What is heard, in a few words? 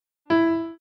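note sounds; zvuki not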